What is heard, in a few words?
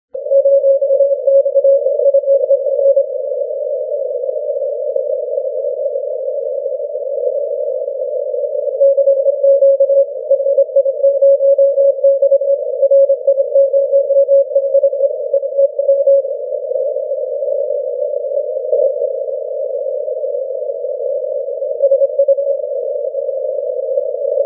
40m cw